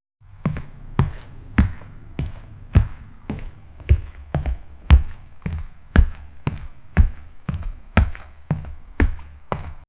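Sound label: realistic footsteps; game audio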